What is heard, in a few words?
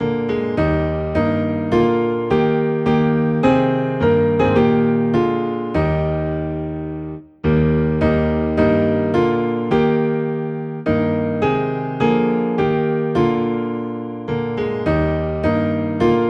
Piano Low in E Flat, hymns; Oh Worship the King